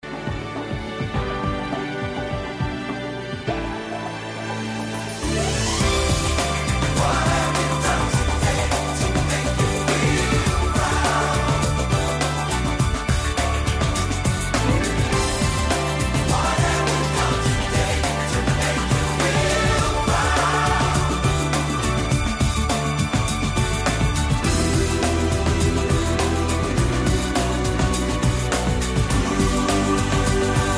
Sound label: chorus only